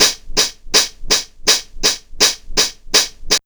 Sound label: HH LOOP; USB Soundscan vol.27